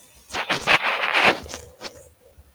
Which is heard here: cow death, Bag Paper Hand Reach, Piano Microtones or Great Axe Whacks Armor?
cow death